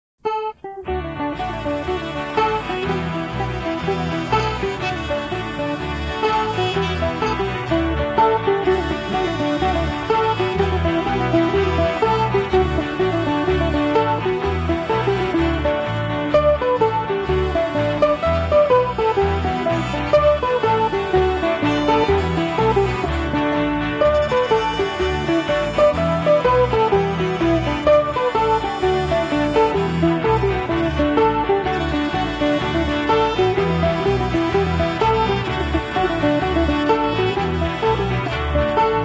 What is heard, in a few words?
jig